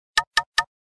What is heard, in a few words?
sms; KU990i Viewty